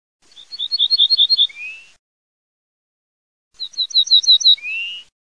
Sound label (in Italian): ORTOLANO, birdsound